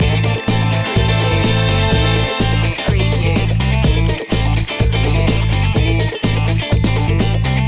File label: disco funk loop